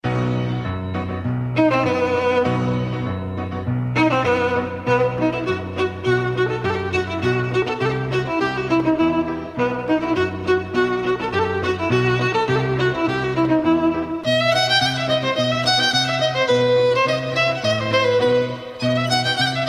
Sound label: Violin Sound